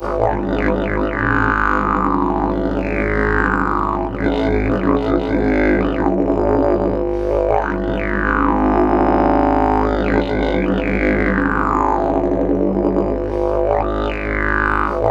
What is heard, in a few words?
LONG DRONE, Didgeridoo; Big Fish Audio - Didgeridoo and Other Primitive Instruments